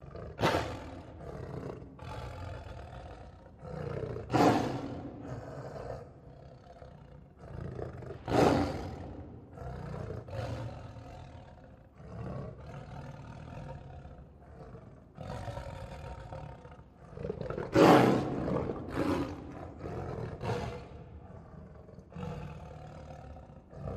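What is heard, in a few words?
Single Lion Snarl Roar; big cats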